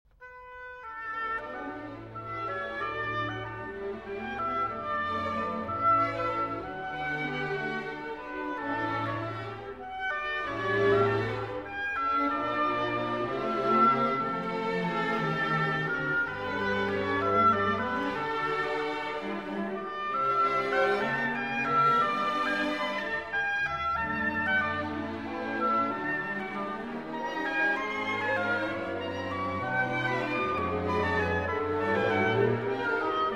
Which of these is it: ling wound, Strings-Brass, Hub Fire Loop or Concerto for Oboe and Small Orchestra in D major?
Concerto for Oboe and Small Orchestra in D major